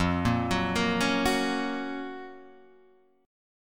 Gb Major F; Gb/F arpeggiated; JGuitar

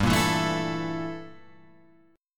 G Minor 6th Add 9th, Guitar, Open F; Gm6add9 strummed; JGuitar

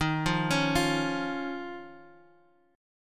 Eb 7th Suspended 2nd Eb, Guitar Half Step Down

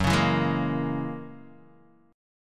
F sharp 9th, Guitar DADGAD

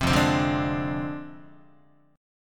F Minor 6th F down Guitar; Fm6; strummed; JGuitar

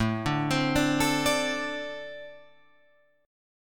A-Minor Double Flat 5th A Guitar Open F; arpeggiated; JGuitar

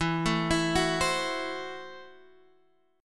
Gb Minor 7th Flat 5th, Guitar

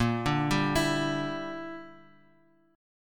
Bb 6th Flat 5th Bb, Bass Standard; Bb6b5 arpeggiated

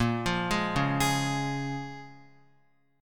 Bb Minor 7th, Guitar, Bbm7 chord; Bbm7 arpeggiated

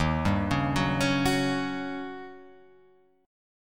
Eb 7th Sharp 9th; arpeggiated; x,x,1,0,1,1,3,3

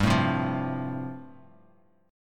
G 7th Suspended 2nd Suspended 4th, Bass Standard; G7sus2sus4 strummed

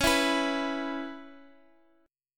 C sharp Minor 7th, Guitar Open F; strummed; C#m7; x,x,x,8,7,6

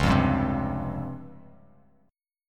C sharp Major 7th Flat 5th Bass Standard; C#M7b5 strummed; 9,8,5,5; JGuitar

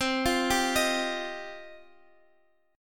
F 7th Suspended 2nd C

